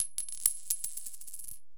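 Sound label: Coins 3 Fall On Concrete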